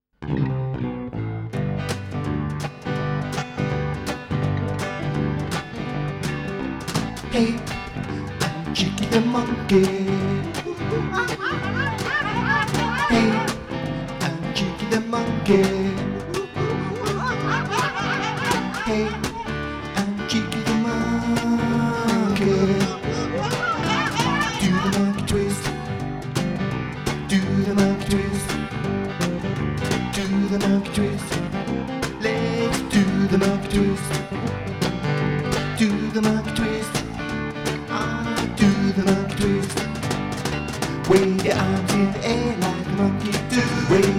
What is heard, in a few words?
Cheeky Monkey Song